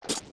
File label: steps jump; Jabu Jabu; zelda; OOT